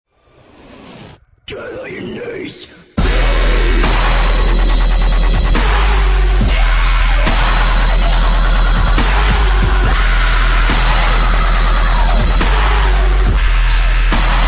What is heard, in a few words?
dubstep, ringtones